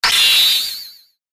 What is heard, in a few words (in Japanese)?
効果 音 シャキーン